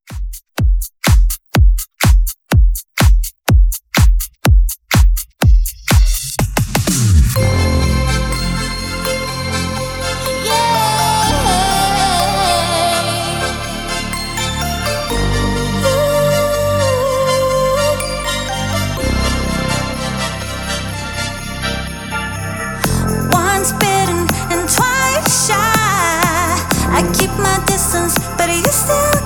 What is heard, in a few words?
Electro Redrum